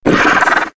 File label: dolphin vox mumble; arksurvivalevolved